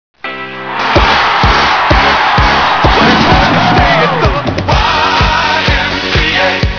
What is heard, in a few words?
cheer; cstrike; kickball